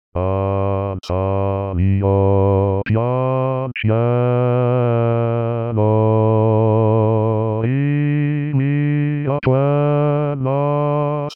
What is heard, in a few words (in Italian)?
basso solo